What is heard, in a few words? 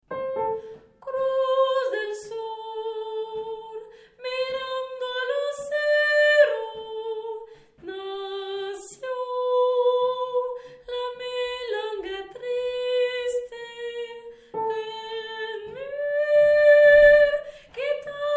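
soprano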